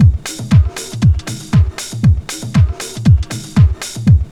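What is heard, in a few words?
drum loop; X-Static Goldmine 3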